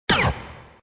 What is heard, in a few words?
Synth Shot Hi, Single high synth hit; Sound Effects:Sound Design